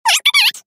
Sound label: clock